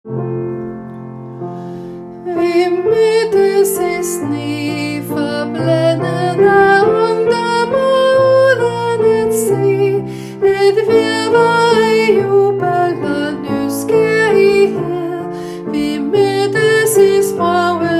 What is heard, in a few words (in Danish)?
sopran